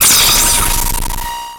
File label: Transition Electrical Surge; Adobe; Production Element